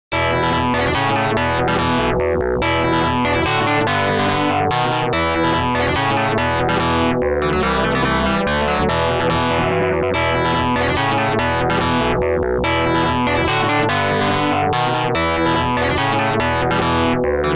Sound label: Combat